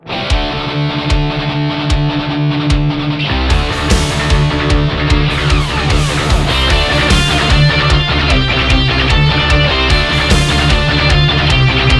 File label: Triumph And Death (Intro)